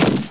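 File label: shotgun; Pamela Anderson Interactive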